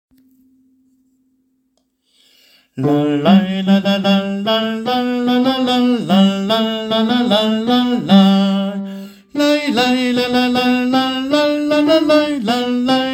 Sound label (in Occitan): airejant hauta; ai vist lo lop